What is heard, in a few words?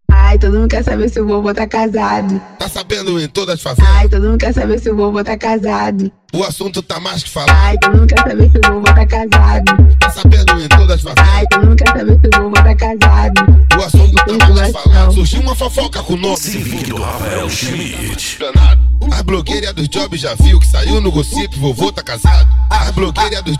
funk mega bass